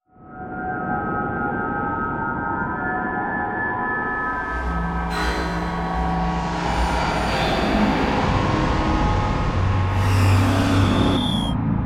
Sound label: Electronic Whoosh Reverse Feedback Digital